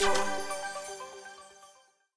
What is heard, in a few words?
spin Button Click; dazzle